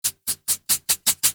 Foley Idle Scratch Fast; Enemies; Warframe; Catbrow

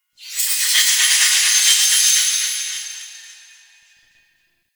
Small Metal Echo; Warframe; Ambience; Entrati

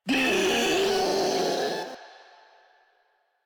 Infested Ancient Vocal Attack; Enemies; Proto Infested Ancient; Warframe; Ancient Ninety Nine

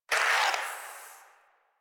Shadow Vocal Attack; Warframe; Duviri

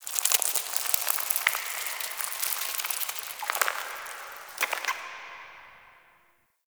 Under City Insect Wet Scurry

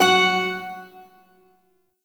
Shawzin Oct Two F Sharp; Warframe; Duviri Shawzin

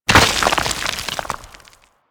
Arachnoid Camper Step Rock Debris; Warframe; Corpus

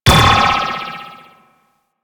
Ball Bounce Goal Post; Warframe; Missions; Tenno Ball

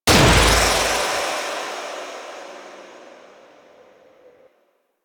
Rift Walk Impact; Warframe; Magician

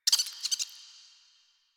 Rat Pet Squeak; Warframe; Ambience; Nineteen Ninety Nine

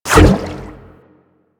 Aqua Board Spin; Warframe; Yareli

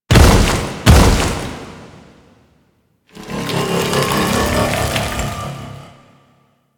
Idle Agile Unarmed; Warframe; Warframes; Idles

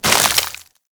Melee Impact Wood; Warframe; Weapons

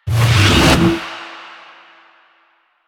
Smoke Screen Start; Warframe; Stalker

